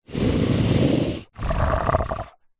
horror monster creature single snarl grunt growl nasal